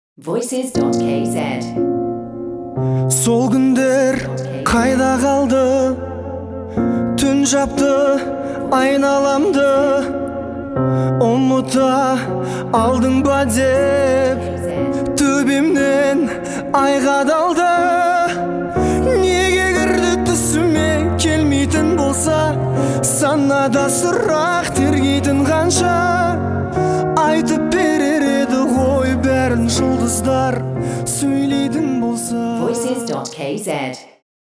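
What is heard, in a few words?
Kazakh vocal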